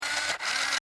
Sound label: Scifi Servo Mechanical Robot Small Motor Toy